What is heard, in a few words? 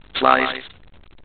sliced; edge extended; android gamerip 2012